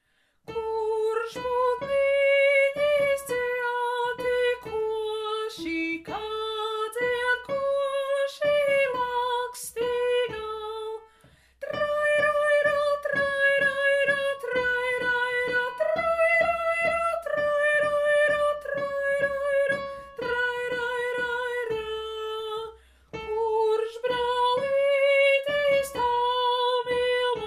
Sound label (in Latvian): soprans